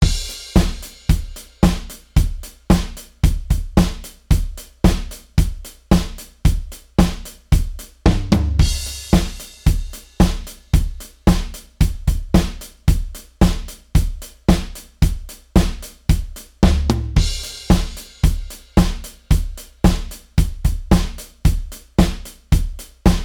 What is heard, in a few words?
modal surf, drums